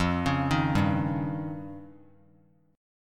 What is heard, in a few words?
F 7th Suspended 2nd Sharp 5th; F7sus2#5 arpeggiated; 17,20,18,17